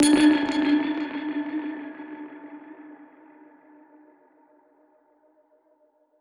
Perc Hit, dub percussion; musicradar

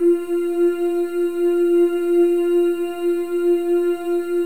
FEM, Symphony of Voices; Spectrasonics

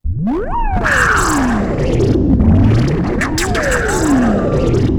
ATMOS; East West; Global Sounds

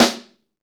snare, Roland; Club-50 - Foundations Roland; Ext.Snare 2

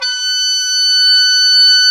oboe, Orchestral Winds; mf f; Roland LCDP04; Oboe 2&3

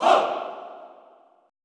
ALL HOO, SHOUTS, Classical Choir; Best Service; Extended Classical Choir

